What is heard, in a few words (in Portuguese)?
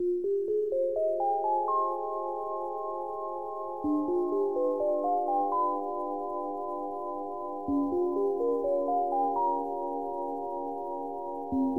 Musica Relaxamento